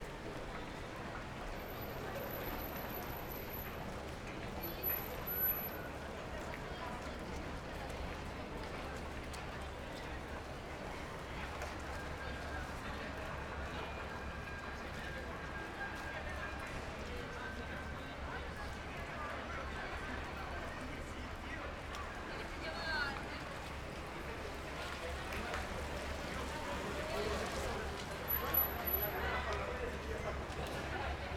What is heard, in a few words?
MADRID Sol Side Street Rain Pedestrians Walla; Systematic-Sound; General Ambience Series; Sounds Of Madrid 01